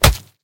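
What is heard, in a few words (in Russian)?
точный удар с разбитым носом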